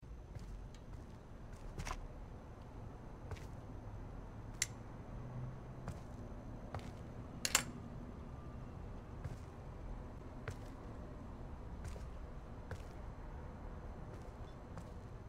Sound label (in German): garage hintergrund; Life is Strange 2; gamesoundopedia